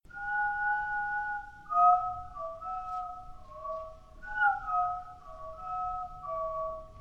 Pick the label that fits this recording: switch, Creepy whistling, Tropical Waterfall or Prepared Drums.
Creepy whistling